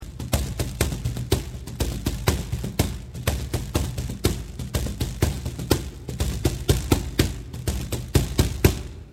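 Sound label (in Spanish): pandero cuadrado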